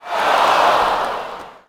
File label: Ooh, Crowd, reactions